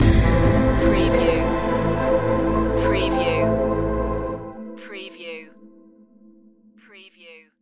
eastern promise stinger

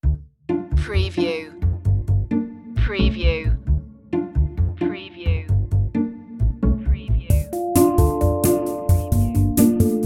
piano lounge

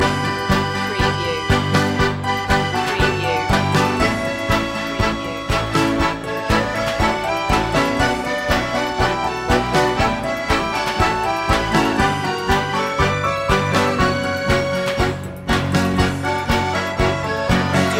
tango loop

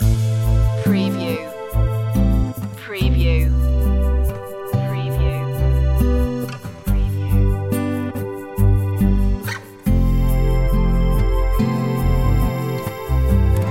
mellow guitars loop

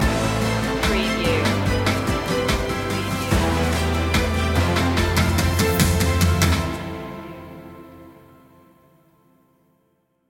reaching a goal stinger

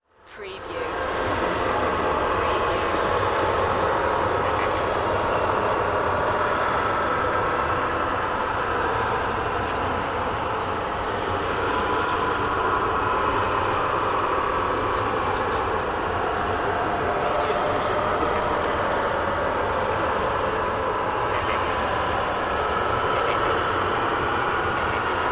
Insane Asylum Screams; Sound Effects Bible

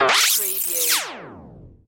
Rewind

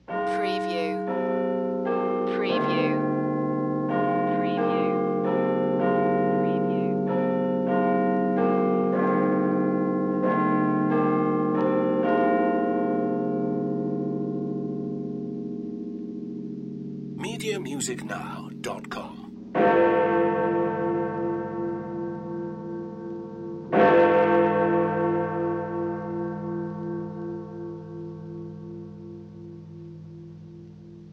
Tower Bell Two O'Clock

